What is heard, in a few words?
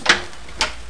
key